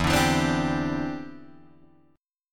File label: E Minor 7th Sharp 5th, down, Guitar, Drop D